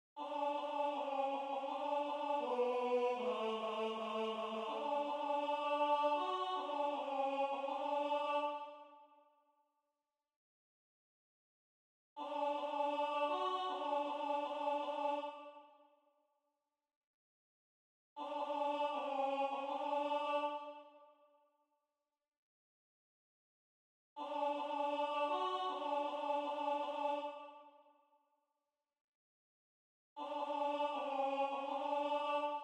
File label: Alto